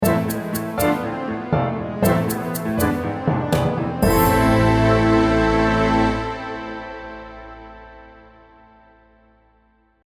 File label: Epic, stingers